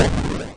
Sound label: EXPLODE; Diamond Hollow 2